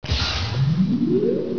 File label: ringoff